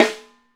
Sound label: tight, snares; Sampleheads - New York City Drumworks VOL-1